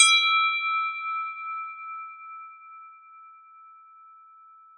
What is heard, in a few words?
bell synth